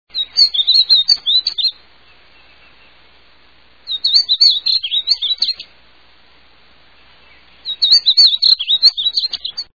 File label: Painted Bunting